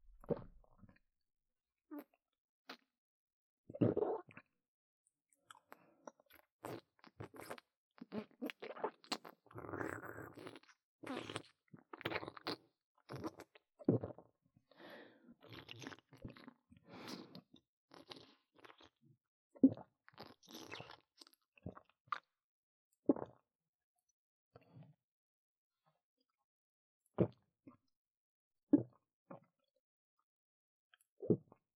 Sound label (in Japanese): ゴクリ 正面・近; 特典ゴクリ音 高音質